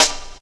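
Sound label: snare drum, Drum & Bass; Ueberschall; Drum & Bass Resonance; 150 BPM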